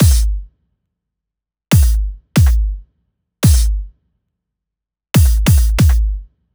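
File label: drum Loop 140BPM bass drum; Vengeance - Essential Clubsounds Vol.5; VEC5 Drumloops; VEC5 Multi Loops 140BPM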